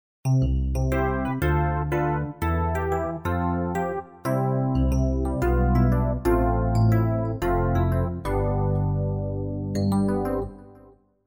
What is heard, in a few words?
Tines